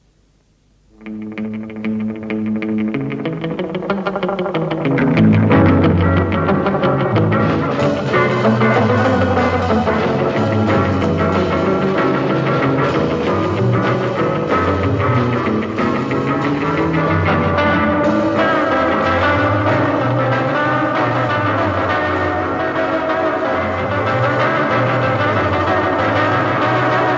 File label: Shotguns Echo Improvisation